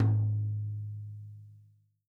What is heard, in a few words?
TOM, Real Drum Kit, FUNK KIT